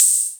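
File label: CR78 open hat; Ensoniq ZR-76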